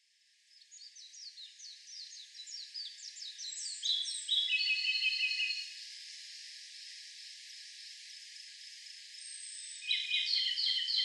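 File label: Ryukyu robin, yanbaru; akahige; koe; moriya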